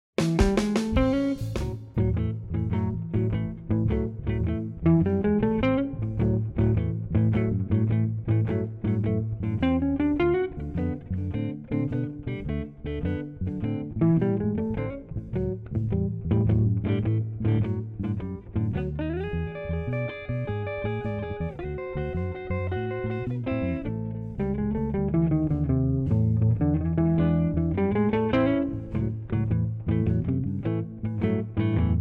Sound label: Shuffle